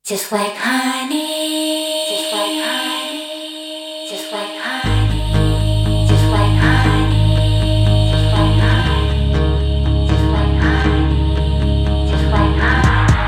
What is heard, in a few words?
Guitar